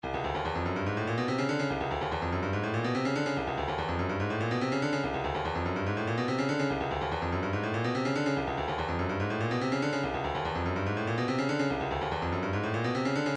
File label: 144 shaker